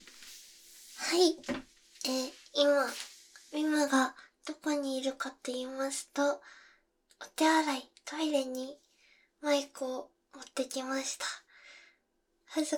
おしっこ(トイレ) カウント バイノーラル

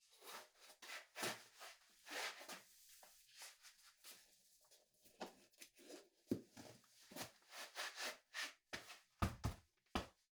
スニーカーを履く; 3DIO; 色々な動作

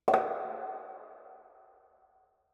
湯おけ リバーブ; 単発効果音

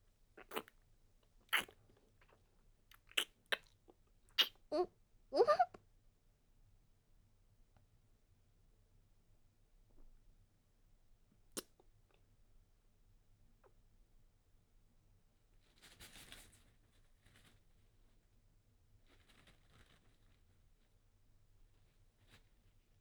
フェラ 失敗 バイノーラル

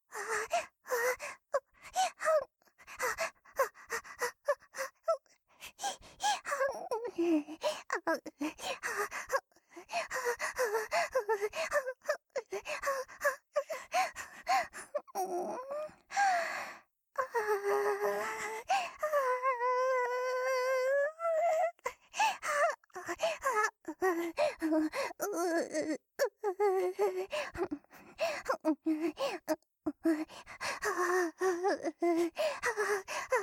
生理前後の喘ぎ声

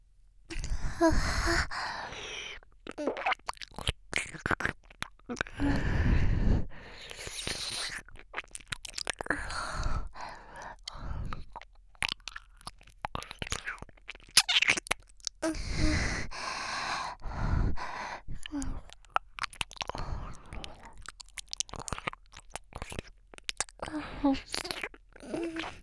すすり上げながられろれろ耳舐め

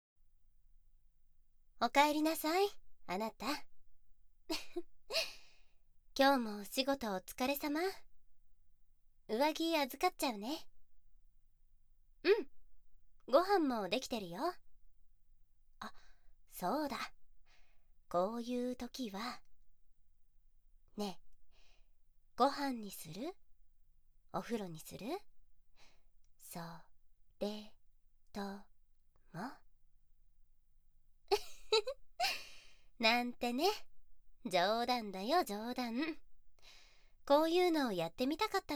犬の真似耳舐めエッチ 効果音なし

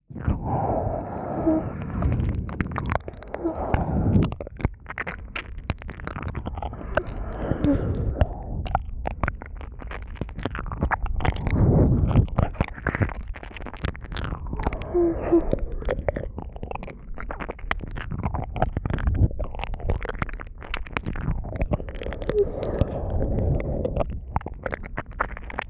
お知らせ左耳舐め; 耳舐めタイマー編

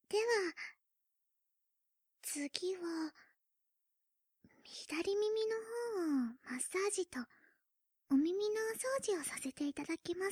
左耳マッサージ（効果音なし）; 見習い天使が頑張るあなたに癒しをお届け; かしまし☆とらいふる; 効果音なし通常編集版

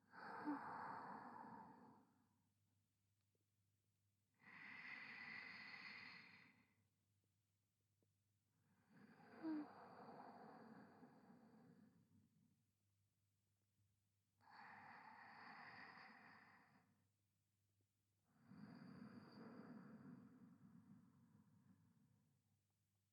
添い寝（寝息）ループ仕様, ASMR

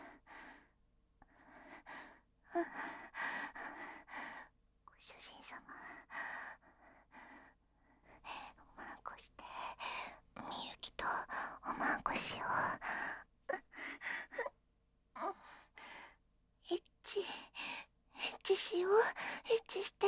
エッチしよ 喘ぎ; DL製作班; ハーレムオナサポ 4人のメイドがご主人様のオナニーをお手伝いするバイノーラル

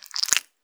膣内射精後, 引き抜かれた瞬間; ■挿入or引き抜く

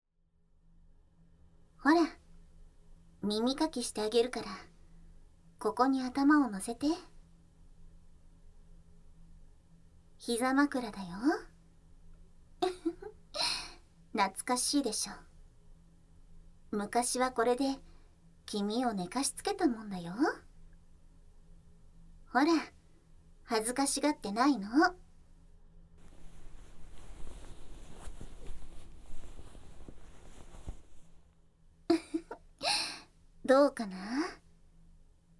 耳かき、ふーふー、お姉ちゃんの吐息

